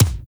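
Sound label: tuff guy kick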